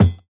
Kick, jungle breaks drum sounds

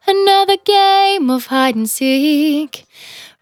Finally 70bpm C, Velvet Vocal; Zero-G

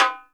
REPINIQUE; E-MU Producer Series Vol. 5 – 3-D Audio Collection; 3D Percussives; 3D Ethnics

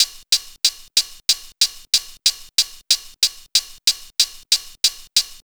HH, Pure Electro; USB Soundscan vol.03; AKAI; MPC

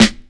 Lo-Fi Agressive Snare

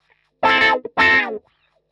125 wah A, wahwah; PowerFX; Nu Jazz House

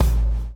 BLOW; Spectrasonics - Backbeat

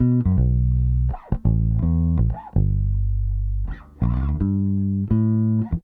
Soul Bass, 70s Analog Funk; Sonic Foundry (Sony Creative Software); What it Is!

